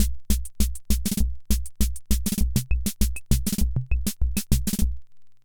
Hammond Auto-Vari 64 Loop, drum machine

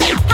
SCRATCHES

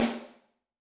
Amb, Yamaha DTXpress-II snare

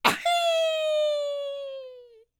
YELP, World Voices, ANDES FX; Spectrasonics Vocal Planet

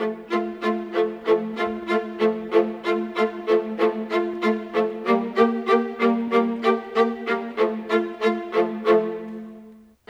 Viola, Orchestral; Sonic Foundry (Sony Creative Software); Orchestral VOL-2; Modern 29

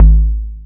Moog B Drm, dry kicks; Quasimidi Rave-o-lution 309

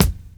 BD, BASS DRUM, 70's Breakbeats; USB Soundscan vol.46; AKAI

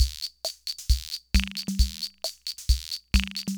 Loop, sr-95; classic machines

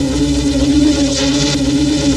HORNET; Best Service Dream Experience; FILM-TOOLS

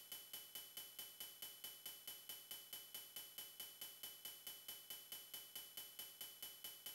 METAL, Techno 138 BPM; Best Service ProSamples vol.54; AKAI; FM BREAKER

